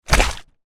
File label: fall